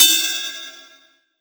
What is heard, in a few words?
Ride, Cymbals